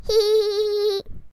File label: cute laugh